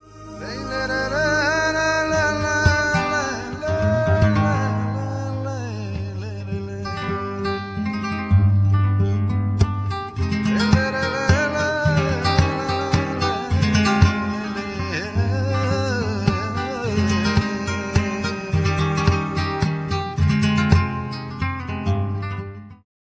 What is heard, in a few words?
Flamenco Chill